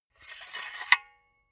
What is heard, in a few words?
silicone gun empty pusher pull out slow; soundpool